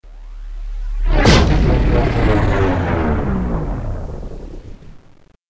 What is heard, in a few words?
Explode; Learn to Fly 3